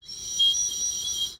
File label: Birdwhistle, clay, painted, dry, airy, wispy, phrase, soft, flutter, timid; Eiravaein Works; Vaeyan IV